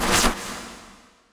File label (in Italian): Artigli